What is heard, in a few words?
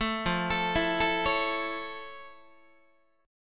F Augmented Major 7th, Guitar; arpeggiated; 12,13,12,14,13,12; down; 4A,4E,5A,5D,5Gsharp,6Csharp